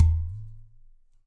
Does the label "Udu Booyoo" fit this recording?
Yes